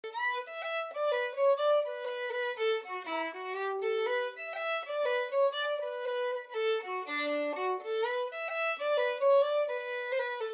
old concertina reel lent

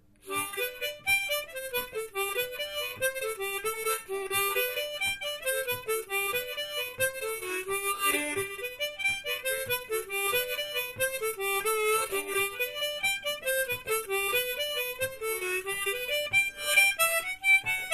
Harmonica

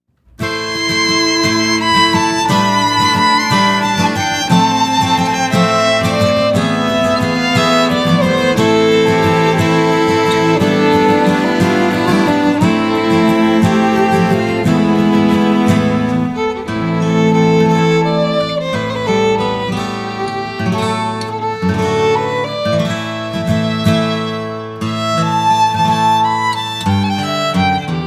Waltz

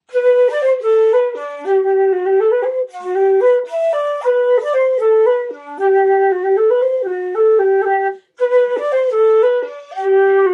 polka

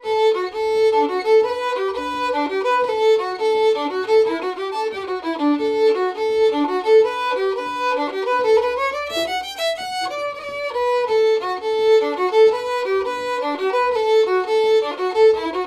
scottish reel

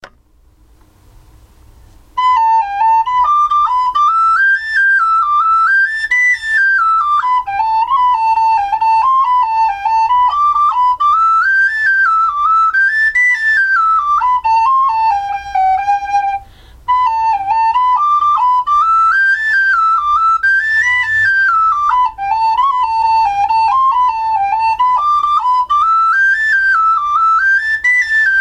slow session

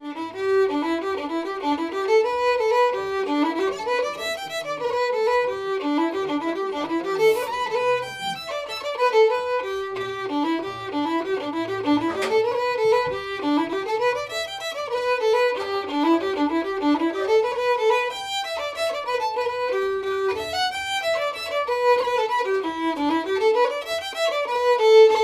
scottish reel

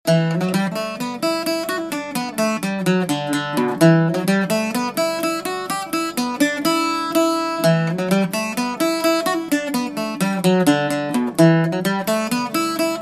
Polka Em

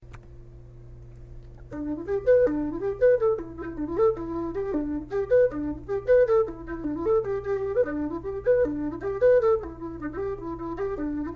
polka fast

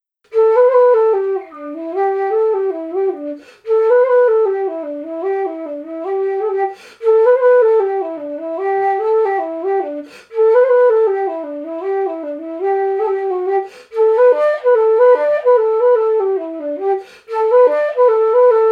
flute; Manys The Things I Saw

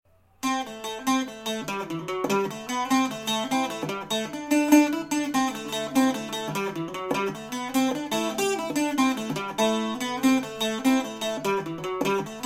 Jig

